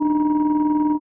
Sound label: ATC msg ring, Cockpit; A320